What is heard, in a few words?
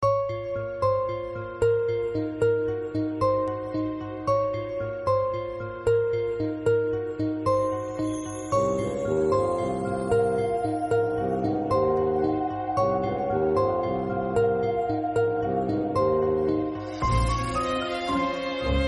french piano ringtone